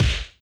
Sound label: punch medium light